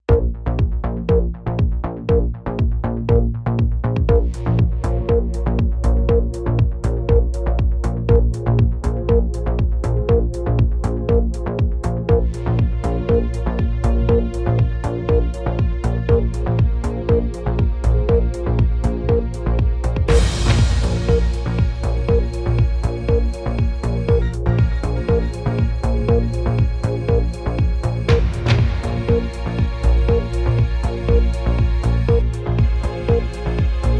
BBC News Channel Updated Countdown

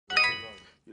Love Island Text Tone

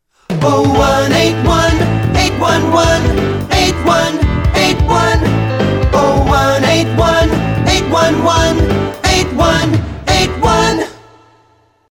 Phone Jingle; Jones TM; BBC; Live and Kicking

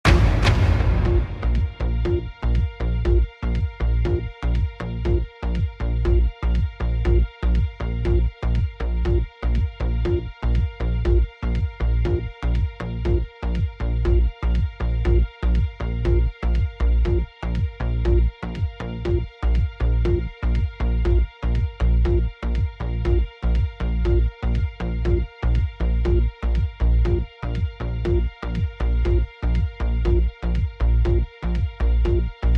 BBC English Regions Headline Bed With Thunderclap

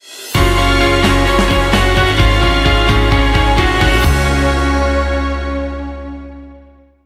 Drums; Daybreak

